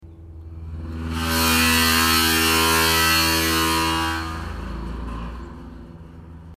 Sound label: carousel